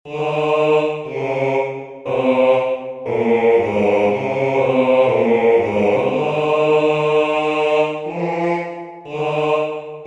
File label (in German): Bass